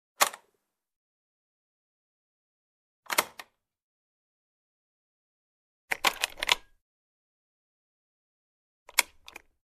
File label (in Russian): razlichnie zvuki dvernih zamkov